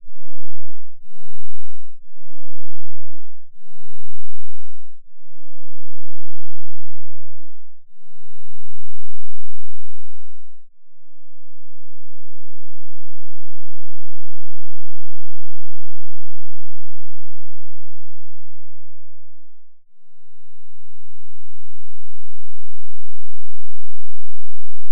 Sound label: .5 Hz To .001 Hz Sine